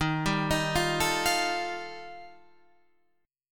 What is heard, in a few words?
G 7th Suspended 2nd Sharp 5th D sharp